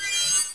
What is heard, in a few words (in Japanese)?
kaihuku; Monster girl quest Part1,2,3; Visual Novels